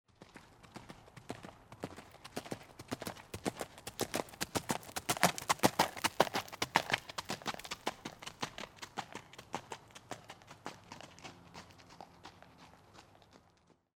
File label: horse gallop dirt